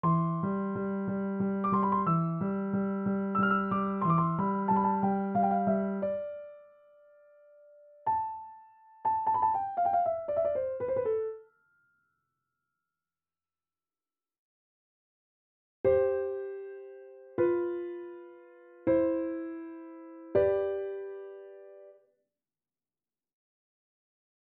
Quartetto in sol maggiore per pianoforte e strumenti a fiato Adagio

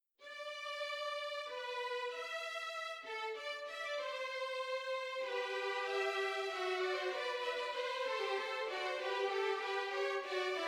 Orchestrazione; Ronge; Gerd Prengel

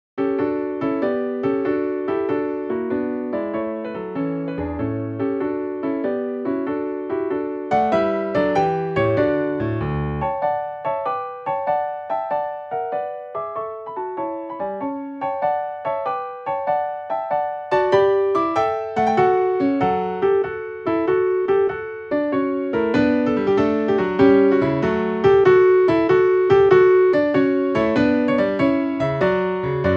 Presto in re maggiore; trasmesso da Ludwig van Beethoven in data sconosciuta

